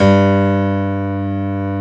PIANO, Pianos Mixe

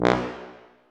TUBA, Tuba stacc; Roland L-CDX-03 Disk 2

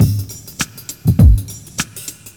DRUMLOOP, Ragga & Dub Reggae

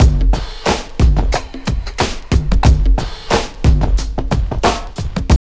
TRIP 90, trip loop

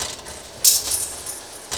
MACHINES

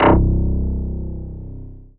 VFX-SD BUZZ, synthesizers; Soli-Music; World of Synthesizers VOL-1

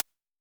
HH Close, Vermona Mono15 Drums Hi-Hats

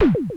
SK1 SYNDRUM; 90 sSampleCDs; Crypton - DrumBase5000

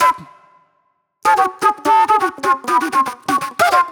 Club Flute; Sonic Foundry (Sony Creative Software); Manhattan Flutes; Super Brown

